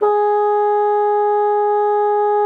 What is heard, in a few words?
bassoon, G#4; Xsample VOL-6